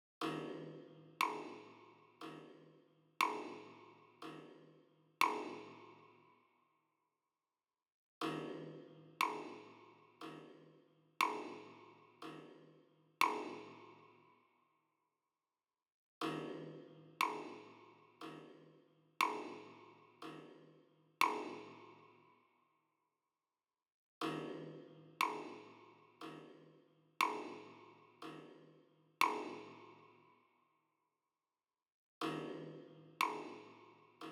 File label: Drums II & Perc; Risk of Rain 2